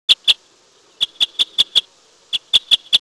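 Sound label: crossbill red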